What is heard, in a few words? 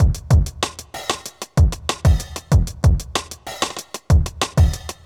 kit; Sound Effect Library